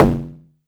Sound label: Tom High